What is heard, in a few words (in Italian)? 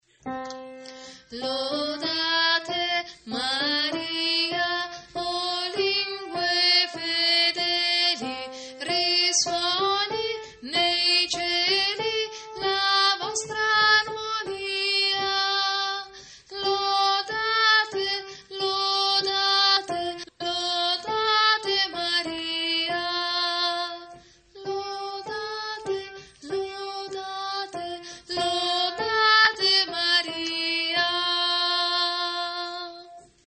lodate maria contralti